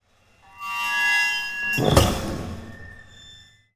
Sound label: Large Gate Close; Game Essentials